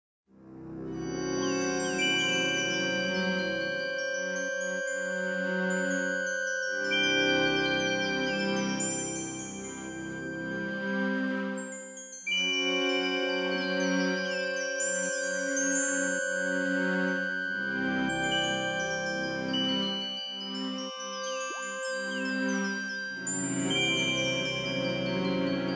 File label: wobbly sci fi energy array